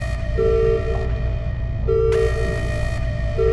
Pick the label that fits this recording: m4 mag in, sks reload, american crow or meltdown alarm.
meltdown alarm